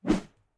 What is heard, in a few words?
action; overte; Hifi Experiments; audio Feedback